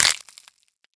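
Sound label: ricochet, weapons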